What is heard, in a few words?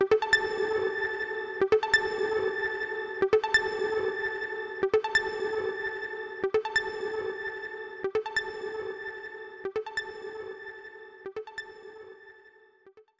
Progression Stinger; Botanist